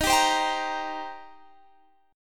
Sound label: Eb 6th Eb down Guitar Standard; Eb6 strummed; JGuitar